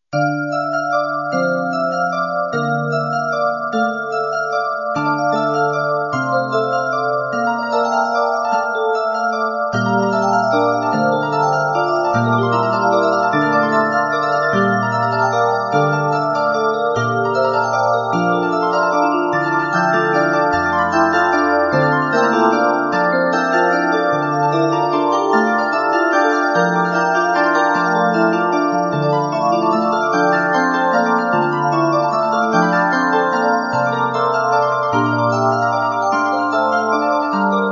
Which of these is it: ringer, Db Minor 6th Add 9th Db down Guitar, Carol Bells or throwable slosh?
Carol Bells